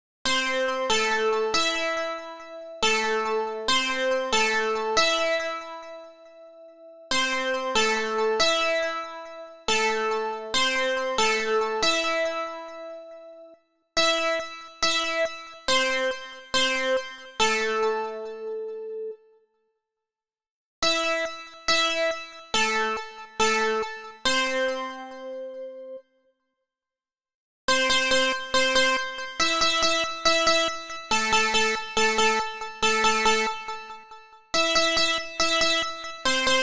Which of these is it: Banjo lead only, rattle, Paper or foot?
Banjo lead only